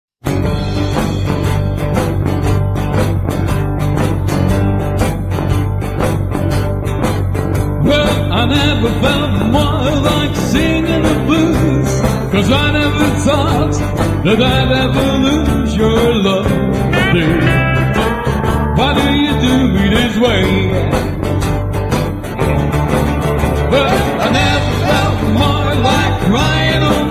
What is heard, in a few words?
Singing